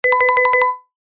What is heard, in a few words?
cartoon vibraphone flutter; zapsplat